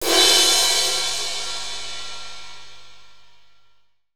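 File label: Kit Heavy crash; CMI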